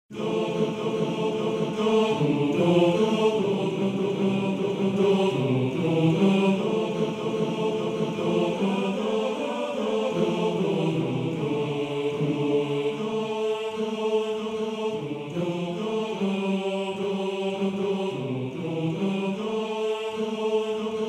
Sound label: Chor